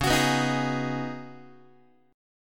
Db 9th Suspended 4th Db down Guitar; strummed; JGuitar

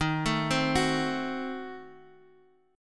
D sharp Diminished 7th, Guitar, Half Step Down; D#dim7

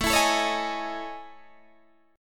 A Major Sharp 11th, Guitar, Standard; AM#11 strummed

